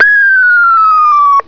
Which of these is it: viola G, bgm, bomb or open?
bomb